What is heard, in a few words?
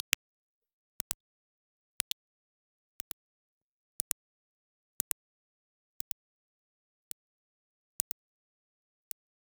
interface appear sound